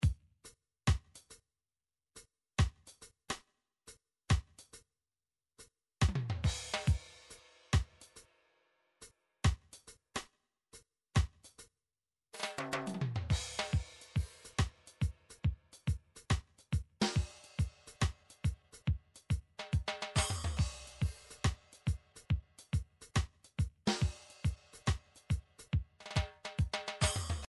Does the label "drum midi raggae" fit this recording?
Yes